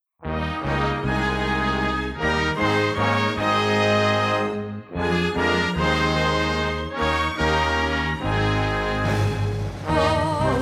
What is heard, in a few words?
national anthem usa vocals; the ssb